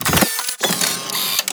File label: Camera Consumable Unequip; Warframe